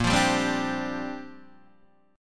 Bb 6th Add 9th Bb Guitar Open D

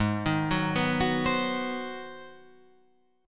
G sharp 7th Sharp 9th

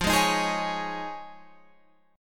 F Minor 7th Sharp 5th, Guitar, Open F; Fm7#5 strummed; 17,15,15,15,16,15

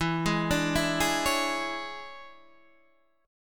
E 13th, Guitar, Half Step Down

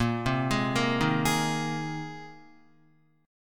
A sharp 7th Suspended 2nd Suspended 4th, Guitar; A#7sus2sus4; arpeggiated; 6,3,6,3,3,3

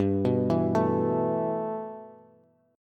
G 6th Add 9th G, Bass; G6add9 arpeggiated

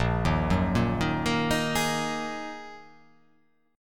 A sharp 7th Suspended 4th Sharp 5th, guitar chord; 6,6,4,3,3,5,5,5

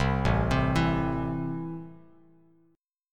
A Minor, Bass Standard; 8,0,10,9; JGuitar

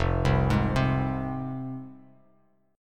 F Minor F down Bass Standard; Fm arpeggiated; JGuitar

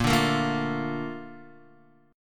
Bb Major 7th, Bb, down, Guitar, DADGAD

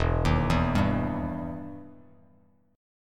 E Minor Major 7th E down Bass Standard

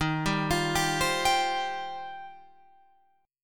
Eb 6th Add 9th, Guitar, Open F; arpeggiated; 15,14,17,14,15,14

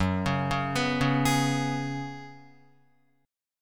Gb Suspended 2nd Suspended 4th, Guitar; arpeggiated; JGuitar

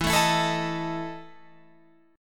E Major 7th Suspended 4th, Guitar, Open F; EM7sus4 strummed

